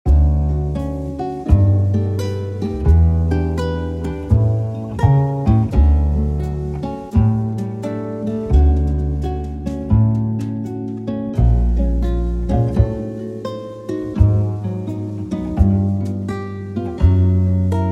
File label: Jazz